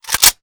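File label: Weapon rifle assault reload chamber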